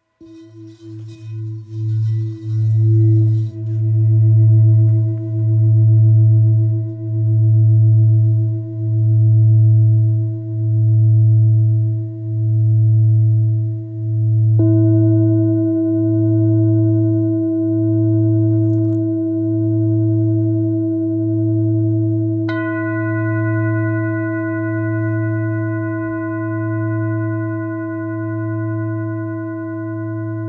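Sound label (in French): Bol grave la 7 chakras, bols chantants tibétains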